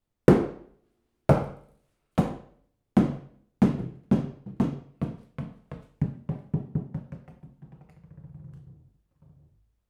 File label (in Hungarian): pattogas, tomor gumilabda; perkupa